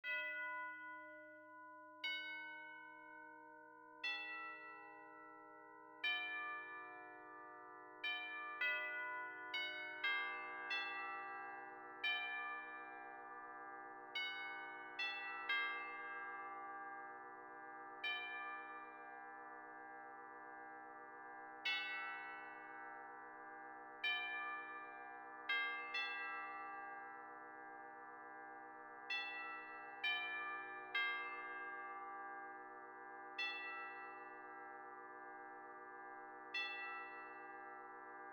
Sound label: windchimes